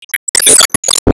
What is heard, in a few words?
Enemy Silkhat Stick Motion; Disney Star Smash; Android, iOS, Mobile